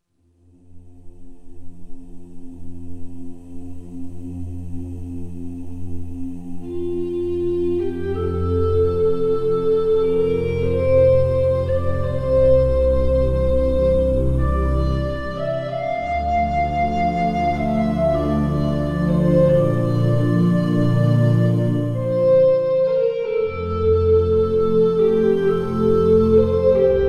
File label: alto recorder